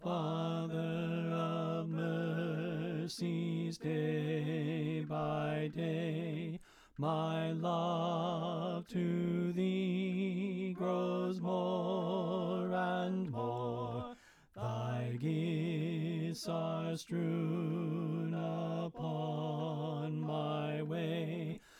Bass Left

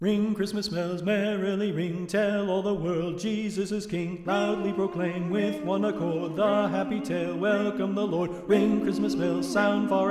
Ring Christmas Bells Reverb

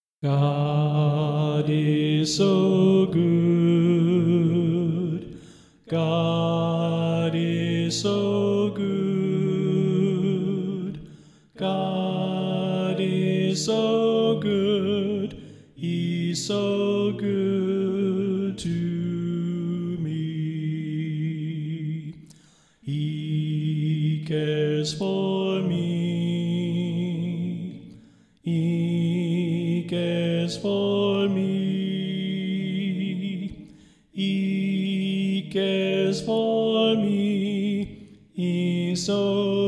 Soprano Left

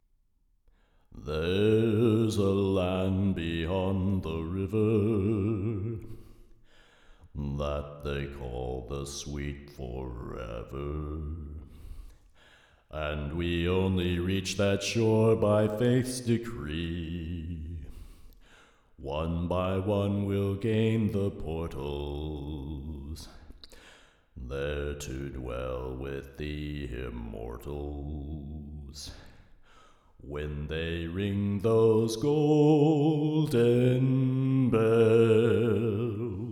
Bass

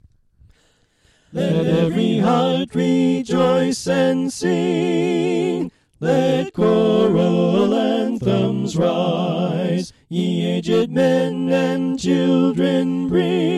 Soprano Right

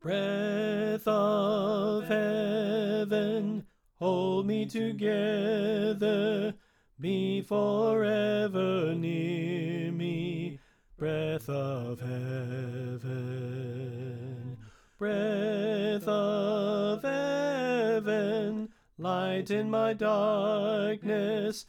Soprano Left

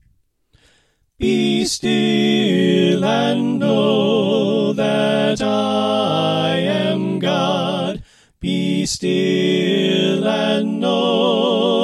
Soprano Right

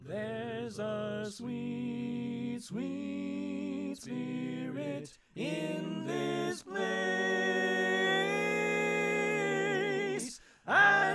Alto Left; Pennsylvania Groups; GQ Camp Reunion

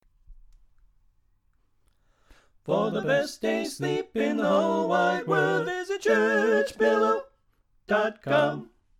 Bass Left; Pennsylvania Groups

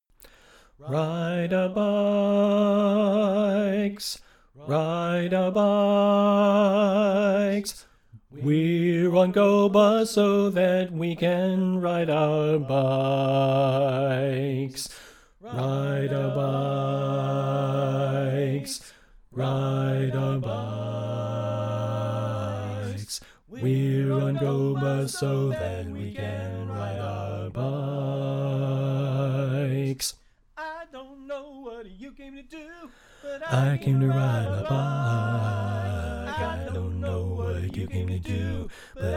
Bass Left

